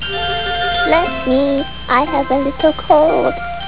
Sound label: sneezer